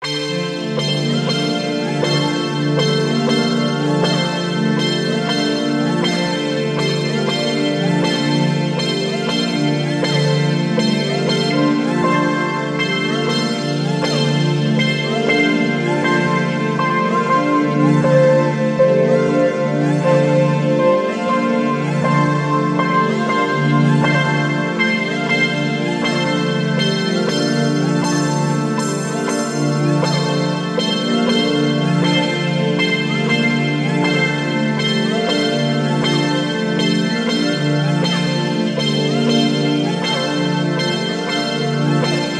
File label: Multi Part Pad; Waldorf Kyra